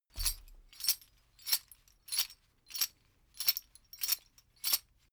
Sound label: metal chain movement sequence